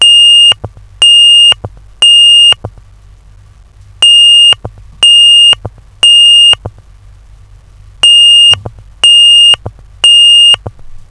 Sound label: tone